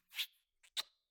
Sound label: Cartoon Kiss